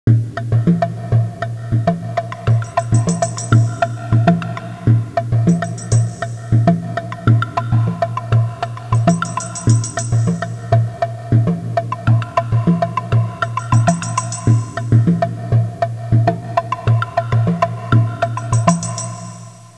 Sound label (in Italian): suonerie musica ritmica